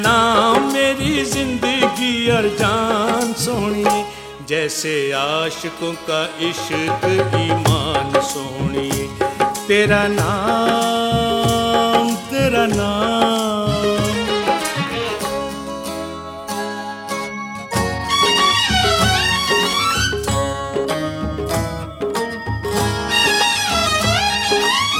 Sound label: male; ishq deewana